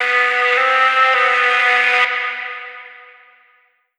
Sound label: phrase Em, Vocal; Slate Digital Omnivox Vocal Sample Pack